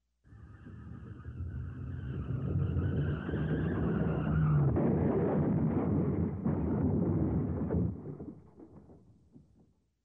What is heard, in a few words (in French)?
Crissements de Pneus accident